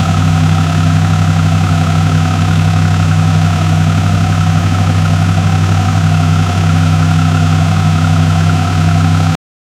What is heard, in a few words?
drift car engine steady, internal combustion engine